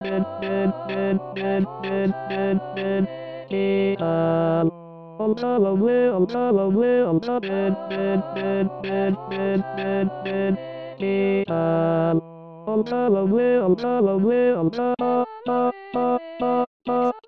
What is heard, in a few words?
SATB tenor